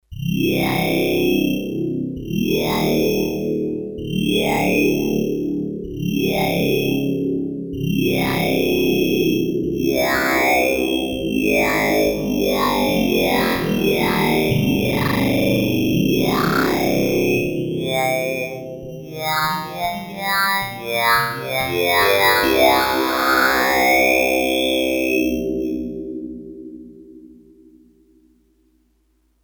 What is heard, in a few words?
Strang Lead